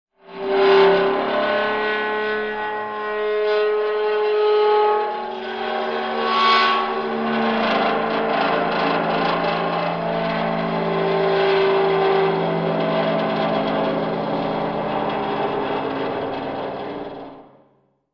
Dry Ice Eerie Long Me

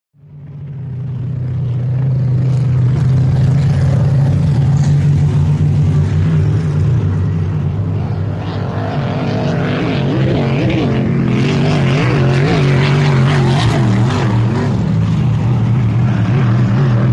Power Boats By Fast C, off shore power boat

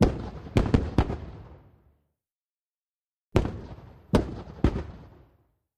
Mortars: 4.2' Moratr, Firing

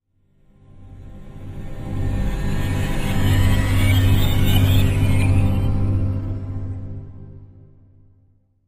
Music Eerie Orchest

